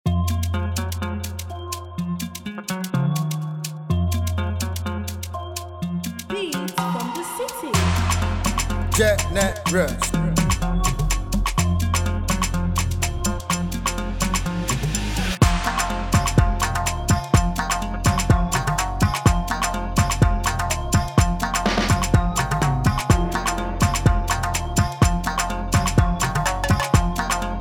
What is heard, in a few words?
instrumental